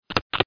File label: click; mines